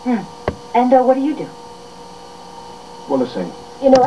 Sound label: sing